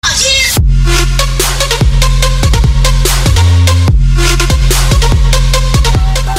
phone ringtone; zonda; mexico

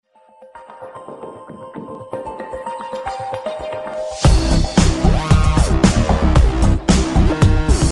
pop theme song ringtone

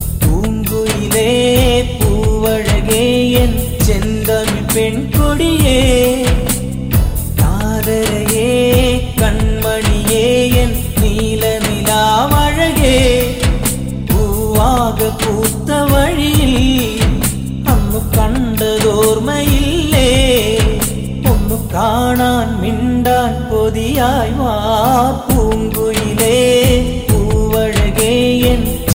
malayalam melody ringtone

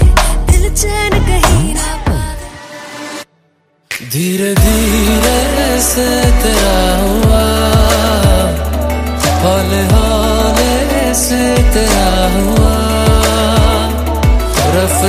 bengali ringtone

